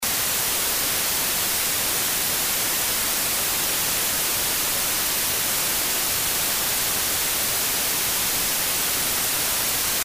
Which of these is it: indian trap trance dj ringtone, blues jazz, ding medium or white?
white